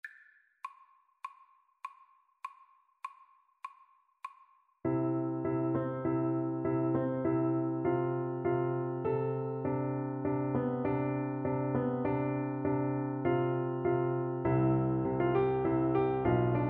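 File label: marimba, Percussion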